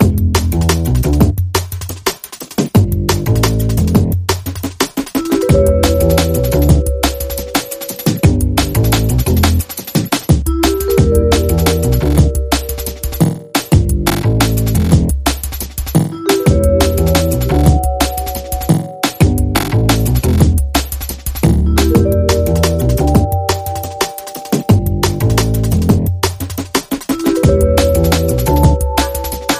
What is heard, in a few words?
Kaboom Underscore